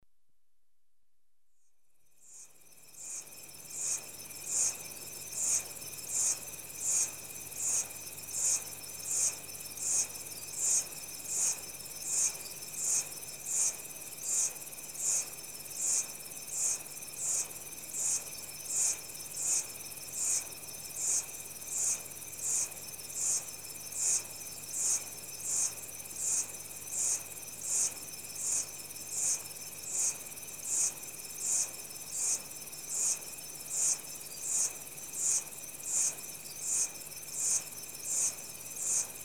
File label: CENTRAL AFRICA REPUBLIC- Insects, early evening, tropical rainforest; The BBC Sound Effects Library; Africa- The Natural World